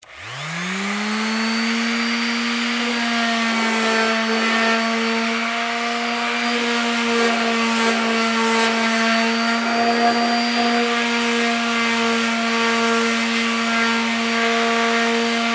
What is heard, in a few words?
Palm Sander Wood, Sand, 2X4; Industry; Machines; Sanders; BlastWave